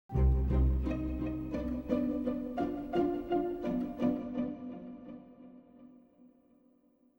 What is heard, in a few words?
MUSIC pizz; Myst III Exile